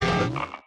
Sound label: atomic bomb inventory move; factorio 2.0.55